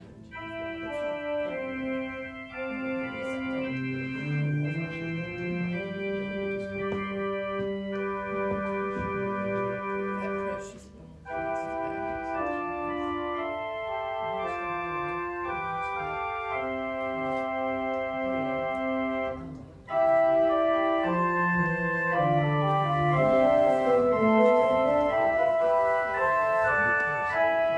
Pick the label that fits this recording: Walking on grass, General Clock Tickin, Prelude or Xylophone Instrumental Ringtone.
Prelude